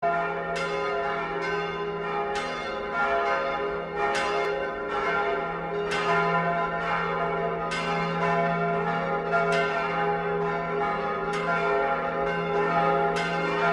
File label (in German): Glockengelaeute der Wieskirche und der Dome zu Muenster Ulm und Strasbourg